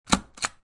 stamp sound, office

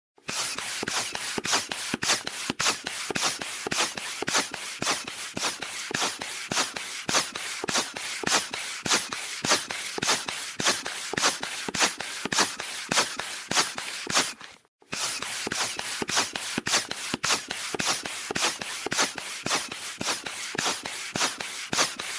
hand pump

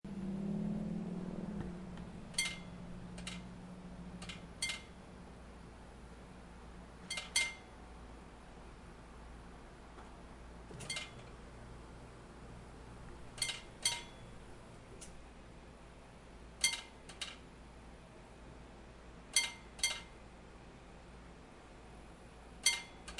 gas station bell

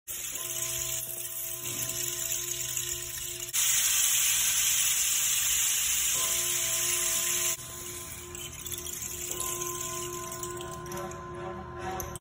Rainsticks

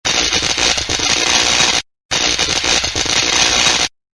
Electric Shock Notification; Shocker

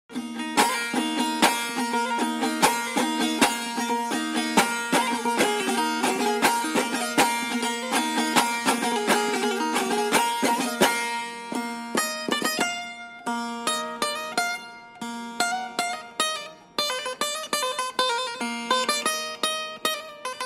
dutar

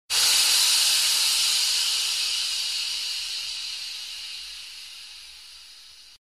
steam iron

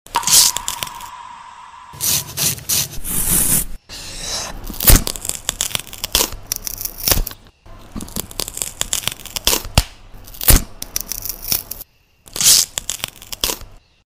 Peel

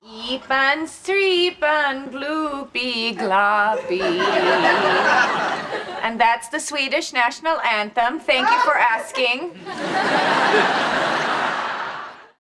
Swedish National Anthem

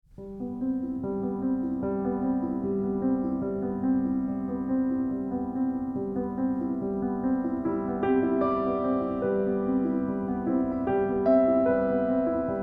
Solo Piano; Harold and the Purple Crayon

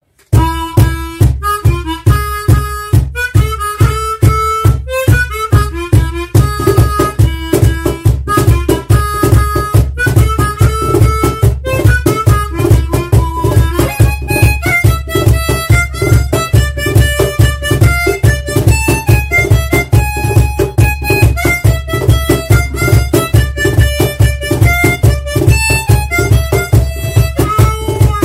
Cajon

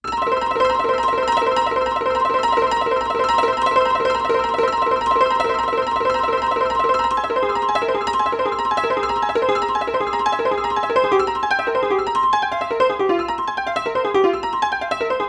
Detuned Mechanical Piano; The Power Of The Dog 2021

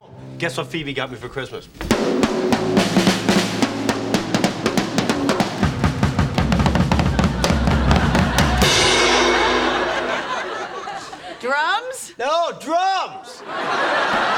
No, Drums!

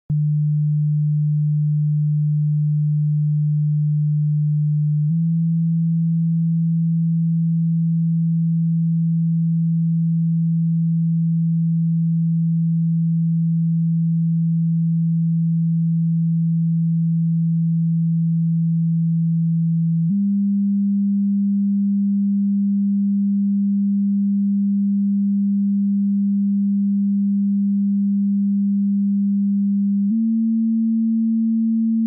Water coming out of the phone

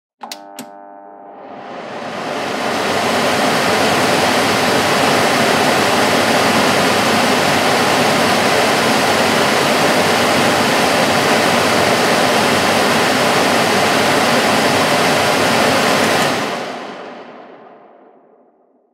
Kitchen Hood

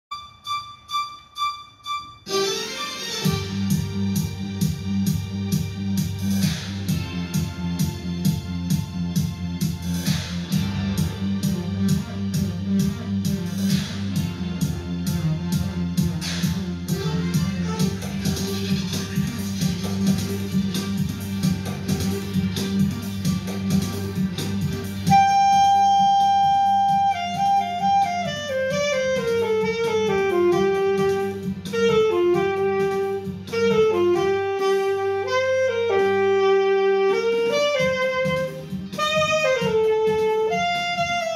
Saxophone

